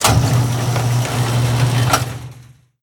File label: Hull Gate